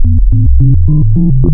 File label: ROBOT, wavetables Classic Synths